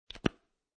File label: Chapstick Put On Cap, Foley, Chapstick, Put On Cap; Lid; Lids; Objects